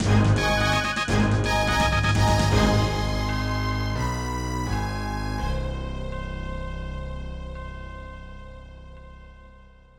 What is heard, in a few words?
Fanfare, Roland SC 55